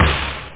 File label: hiphop, drums snare; OctaMED SoundStudio V1